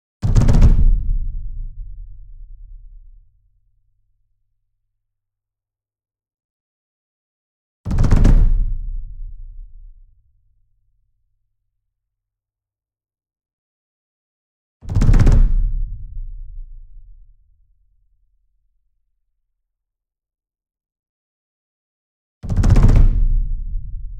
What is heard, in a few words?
Bass Drum Concert 42 Inch Roll Hard Beater Near; SINGLE DRUM; boom library; Cinematic Strikes Construction Kit